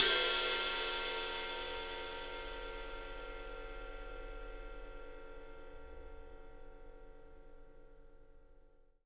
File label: Studio Ride; E-MU Producer Series Vol. 5 – 3-D Audio Collection; 3D Percussives